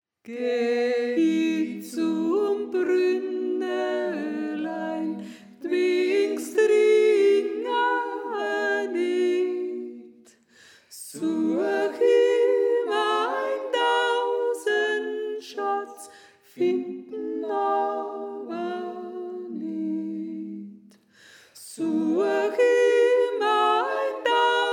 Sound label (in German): Sopran; Geh I Zum Brünnelein